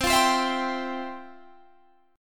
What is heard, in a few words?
F Major 7th Suspended 2nd, C, guitar